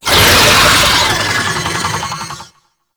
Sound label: Boss Vocal Alerted; Warframe; Oraxia